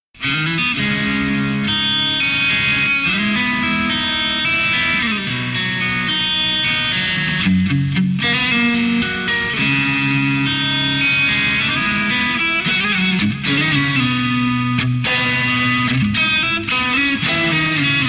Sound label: fender